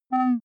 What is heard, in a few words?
click tonal distorted, signale; kommunikation